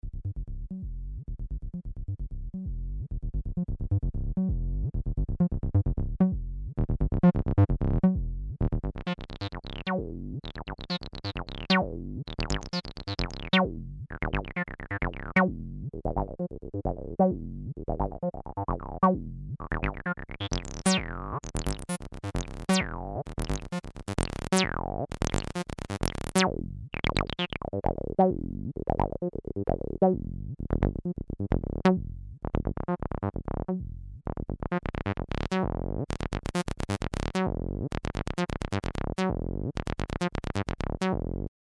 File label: Roland TB-303 Slide Test